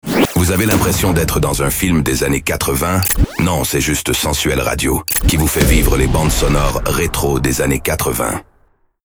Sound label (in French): jingle bande sonore des annees 80